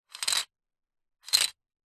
leisure game plastic panel squares on board tip movement; rubiks race